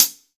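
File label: ambient hi-hat closed, GM drum set; AKAI S-Series CD-ROM Sound Library VOL-8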